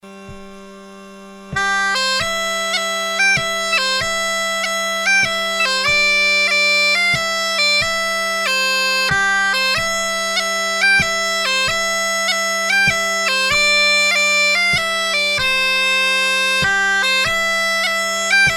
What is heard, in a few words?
mazurka